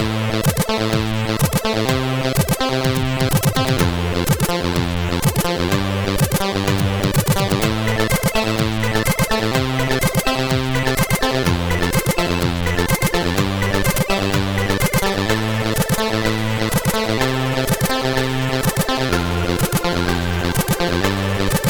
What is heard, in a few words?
Introzak, sid CSG8580R5